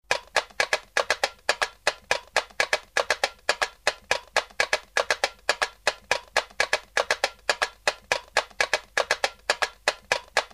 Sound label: tambourim